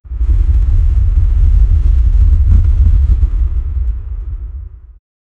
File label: iceberg earthquake sound; geological